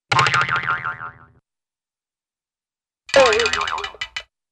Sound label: Boing & Wood Twang - Musical Boing, Cartoon; Hollywood Edge; Cartoon Trax